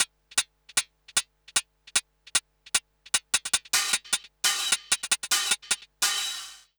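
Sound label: COO hi-hat, drum loop; Big Fish Audio; Uncivilised G'rooves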